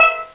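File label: STEEL DRUM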